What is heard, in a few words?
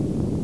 BOOSTER